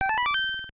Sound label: point; raylib-go; floppy